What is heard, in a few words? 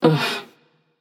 ugh passion; chaac